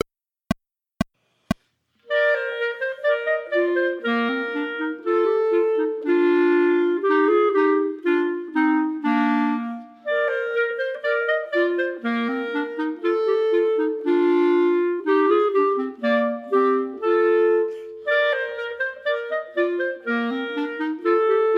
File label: March, minus 1st part, Clarinets; Partita in F; Chamber Music for 3 Clarinets Vol I